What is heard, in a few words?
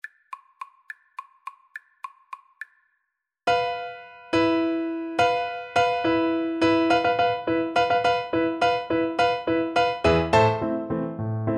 Recorder